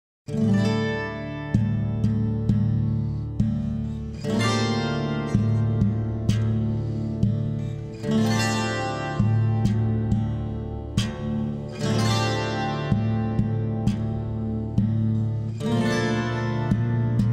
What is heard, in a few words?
shamans drum